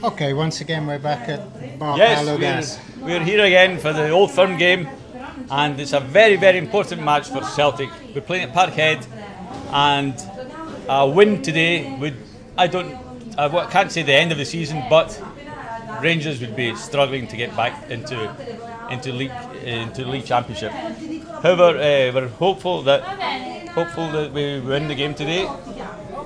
Celtic Rangers match in Barga

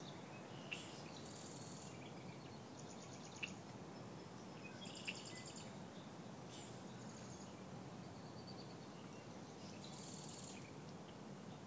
miller woods soundwalk

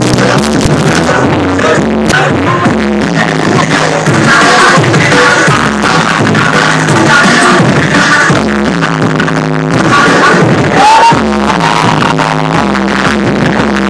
drum and bass in guanabara raving

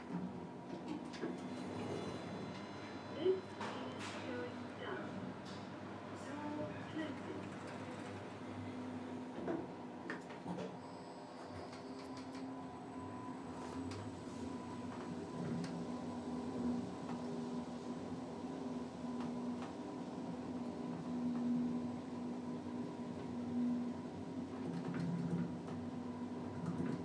60 seconds of city inn lift interior

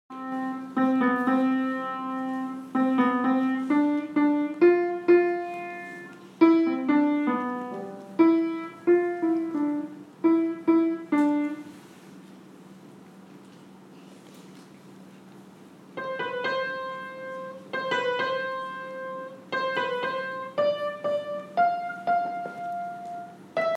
piano house music concert

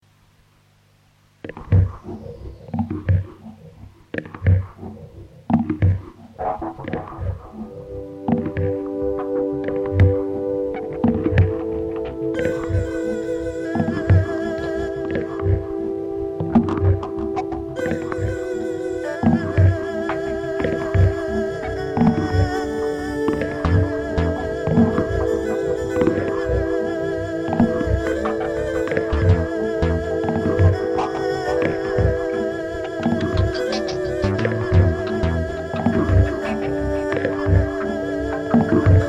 murf drums

